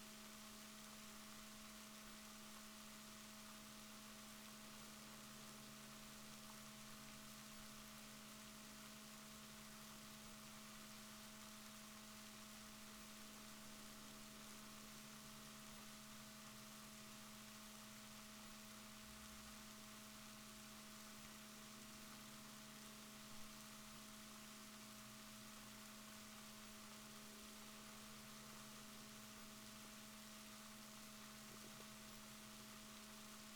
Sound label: rorquals negatif, Balaenoptera Physalus Chile